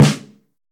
roomy snare drum d key, acoustic snare sample tuned to the D note; snare drum samples